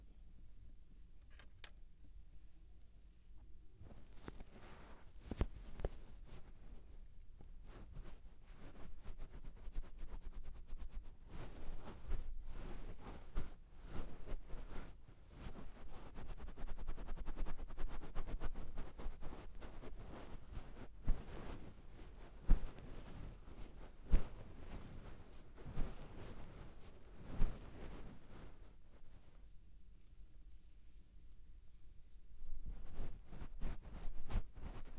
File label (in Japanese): バイノーラル 黒耳 耳 ふわふわ; 同人音声; ASMR・お手軽〜高額バイノーラルマイクの差を調査隊!!